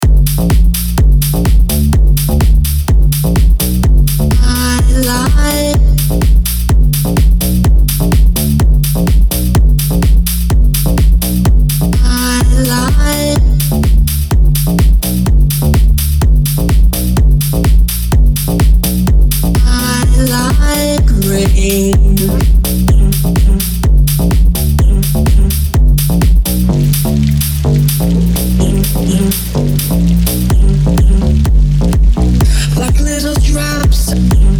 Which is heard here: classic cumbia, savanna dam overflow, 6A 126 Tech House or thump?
6A 126 Tech House